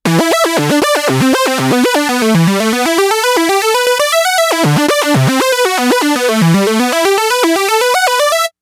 Glide Lead, sequential

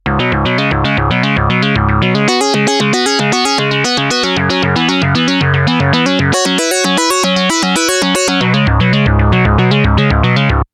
Seek 5ths; sequential

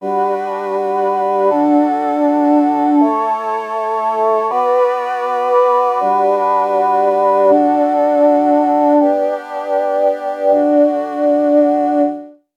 Voxy Pad; TEO-5

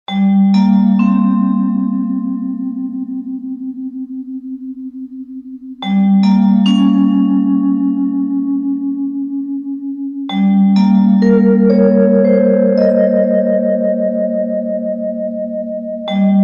Vibes

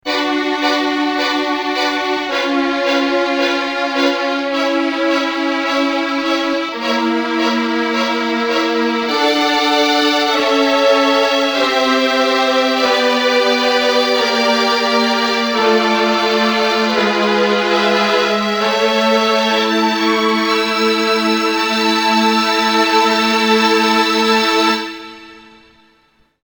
violins

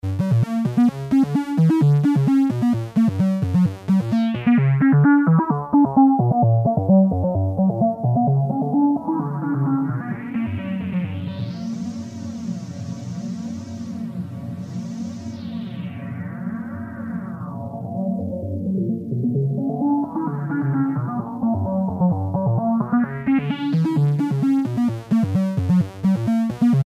vco

